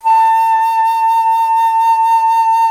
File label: Alto Flute, Solo Instruments